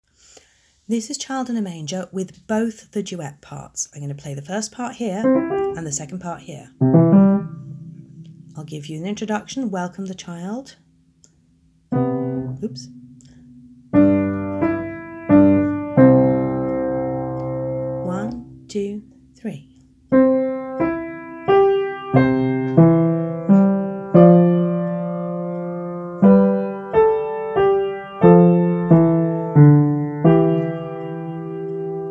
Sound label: child in a manger duet parts, cello